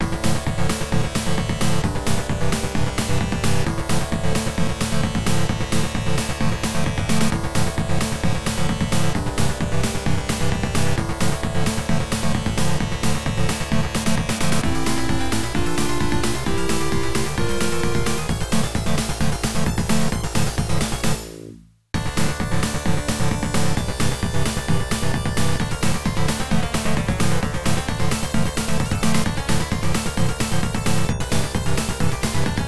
Battle Heavy Metal; Giten Megami Tensei Tokyo Mokushiroku; PC-9801

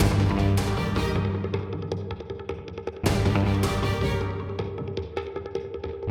Tornado Platforming MIXED; Ben 10

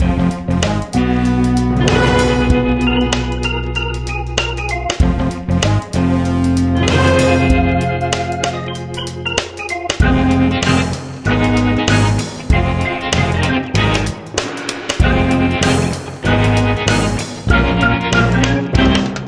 Music; Cartoon Network Punch Time Explosion XL

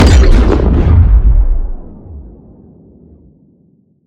Sciweap Shot Large Gun Carbon Injector

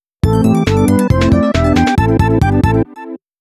Purification Complete!! (Stage Clear)

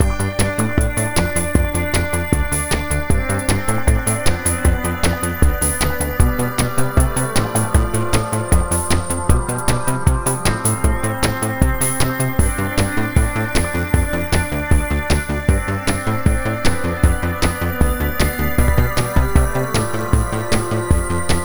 music; Wheel Deal X-treme; arcade

